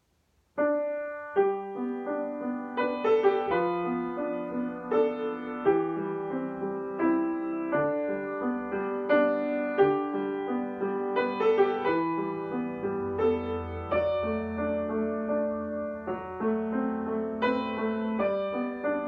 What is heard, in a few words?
Amazing Grace Early Intermediate Piano